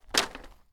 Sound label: Dropping Wood